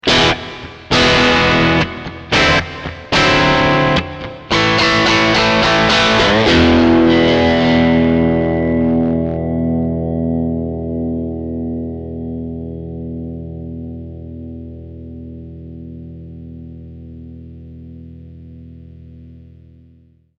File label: dirty bridge mono